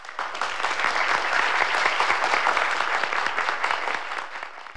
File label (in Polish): OKLASKI